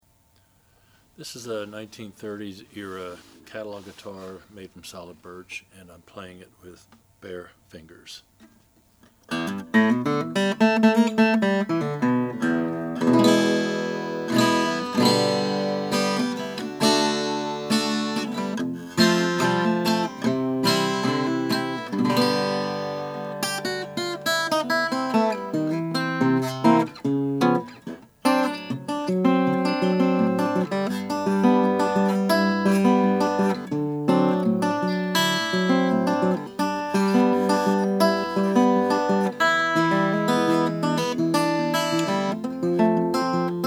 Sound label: stella type red burst; 1930